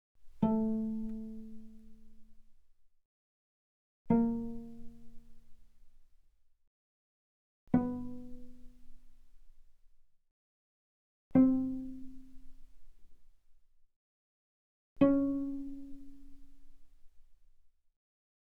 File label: Cello pizz mf sul A A3 Ab4; Strings